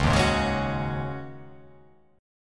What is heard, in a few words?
Db Suspended 2nd Suspended 4th, guitar chord; strummed; JGuitar